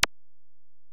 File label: EPerc, Alesis DM-PRO; 300 Drum Machines